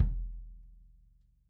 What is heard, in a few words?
kick, Drum Kits